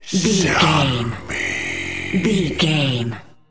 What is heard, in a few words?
zombie voice zombie